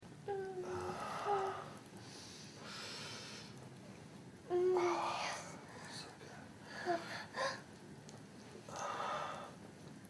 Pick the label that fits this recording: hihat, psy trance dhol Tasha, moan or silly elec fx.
moan